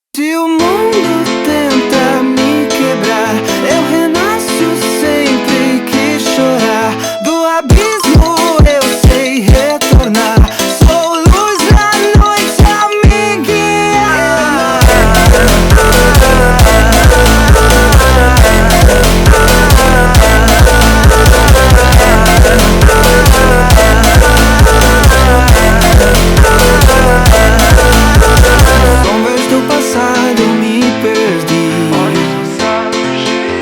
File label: Phonk